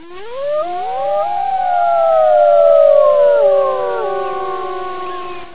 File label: wolf howl; ambient random